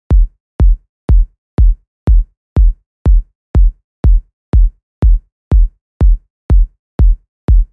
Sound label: 122 BPM Kicks Step 3; mmb drum arsenal vol 1